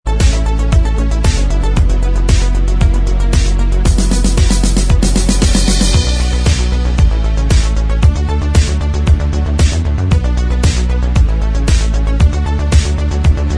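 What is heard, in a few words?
instrumental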